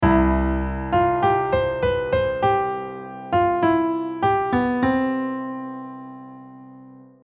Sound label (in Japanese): スケールで考えるメロディー